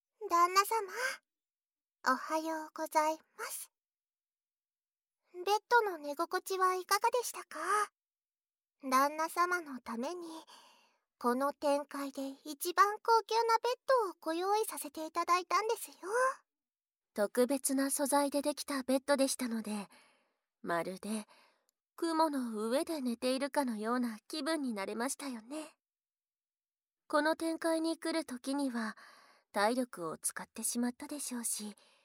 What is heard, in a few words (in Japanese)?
耳舐めフェラチオ, ASMR